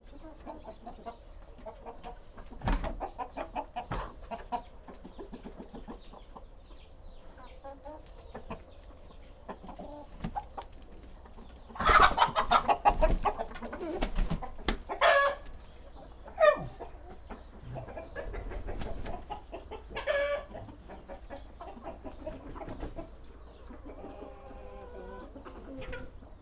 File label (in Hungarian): tyúkól kinyitása kotkodá kukorékolás ugatás; parasztudvar